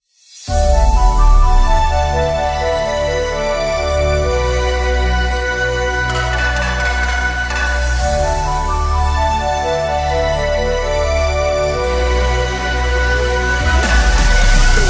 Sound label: shuffle dance mix